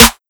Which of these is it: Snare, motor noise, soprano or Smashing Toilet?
Snare